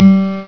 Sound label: HI HARPS, STRINGS